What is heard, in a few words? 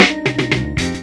Band Fill 117 C, Lounge Lo Fi Break Fillz